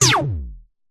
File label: Laser-Like Synth Basic Laser; Sound Spark